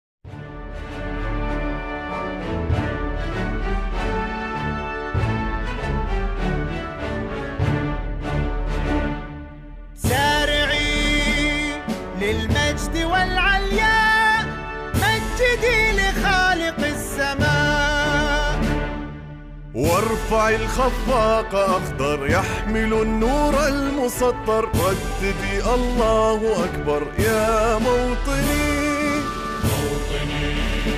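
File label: anthem of Saudi Arabia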